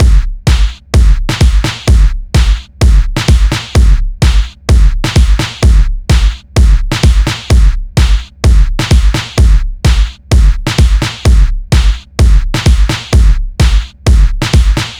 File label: Secondary Kick; super crush k.o.